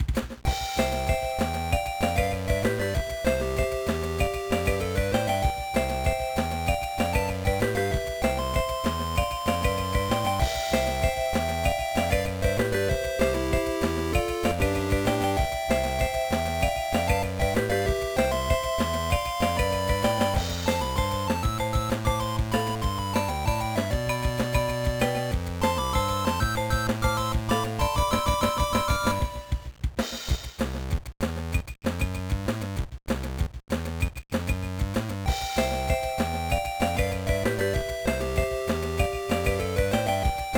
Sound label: BGM; Kiss x Kiss Seirei Gakuen; GBA